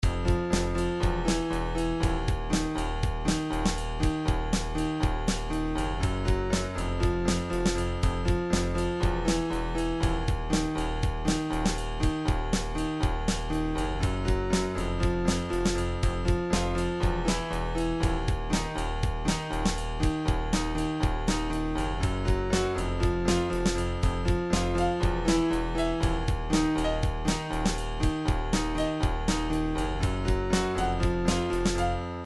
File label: hardcore, midi